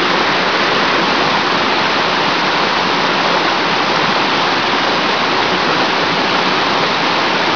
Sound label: stream